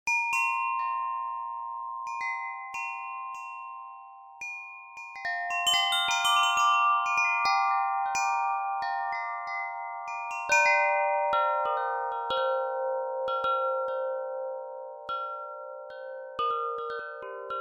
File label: Chimes